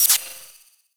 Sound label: Dark Sector Gear Wheel Disabled; Warframe